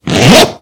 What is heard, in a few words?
spatial zipper opening